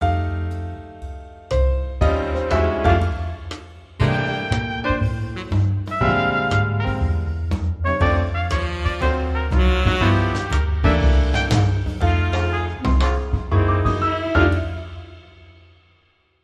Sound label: Swanky Swing